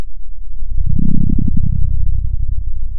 pop, Sound of a dinosaur

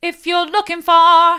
mid, vocal

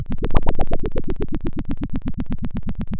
Sound of a dinosaur

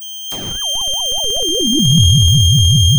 pop

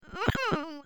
anger strong; VIVAE; vocaltrax

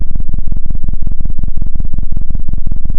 pop, Sound of a cat purring

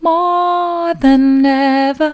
soft, vocal

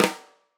Tam Rim, drum

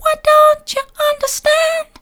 why don't you understand soft, vocal

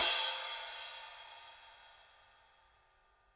Trash

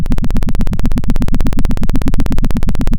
pop, Sound of a helicopter

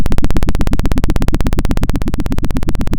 Sound of a helicopter

